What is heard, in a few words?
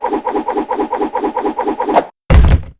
damage